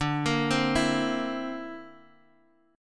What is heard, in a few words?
D 7th Suspended 2nd Sharp 5th D